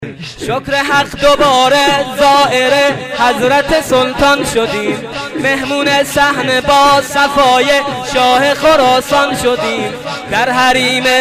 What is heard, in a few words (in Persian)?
Sine Zani